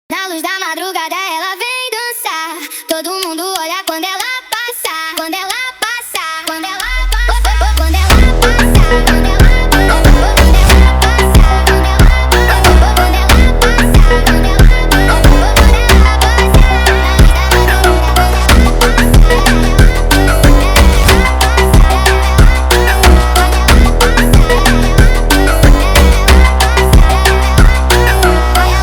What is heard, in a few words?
sped Up Reverb